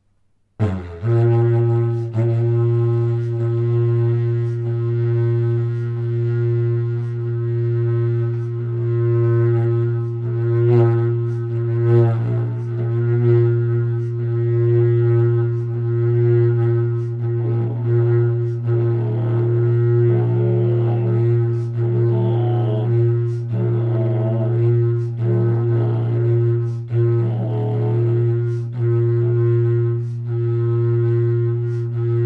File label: Didg langa; En riktigt lång didgeridoo över 2 meter som jag gjort själv.